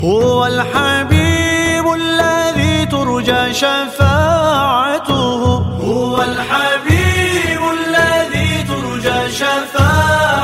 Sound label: islamic tone ringtone